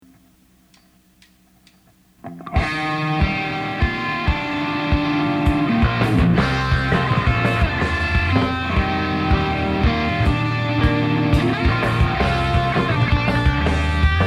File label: False Starts